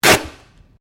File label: Sticky Tape Unroll; common tape; Offices; SoundEffects-Foundation4